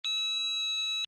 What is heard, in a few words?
Wireless pager alarms in various tones. Alarm, Pager Beep, Pager; beeps